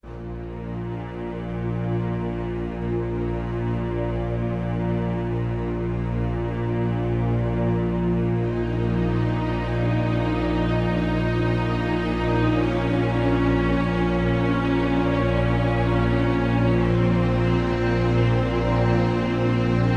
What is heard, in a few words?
Music; CS Russian